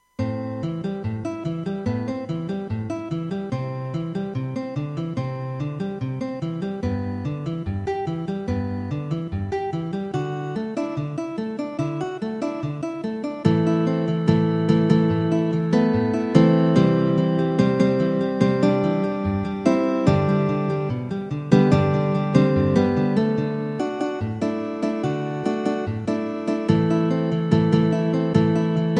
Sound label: synth